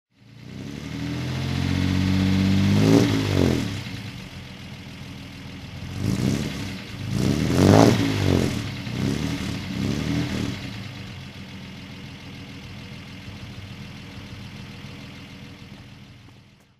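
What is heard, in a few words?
alvis TC21 throttle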